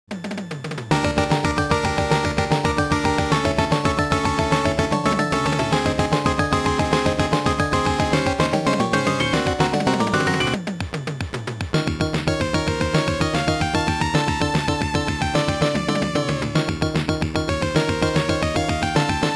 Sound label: Menacing Beat; super star soldier; Stage 7 BGM